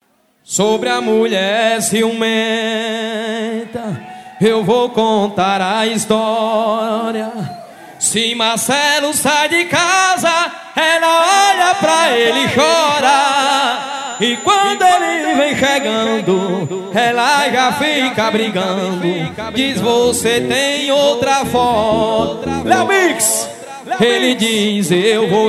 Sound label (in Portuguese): ao vivo, toada; carira se